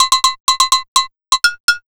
125 DRM, Fresh Disco House; USB Soundscan vol.20; AKAI

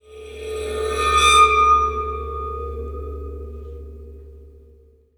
Waterphone Shine; Sonic Foundry (Sony Creative Software); The Electronic Music Manuscript Collection

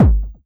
bass drum; 90 s Sample CDs; Best Service Dance Mega House Dance

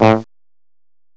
TUBA; BRASS1

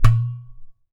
GHATAM, DRUMS OF IND

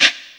SHAKERS; Best Service; Dance Mega Drums CD2

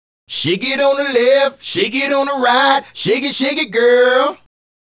Male Group, Vocal

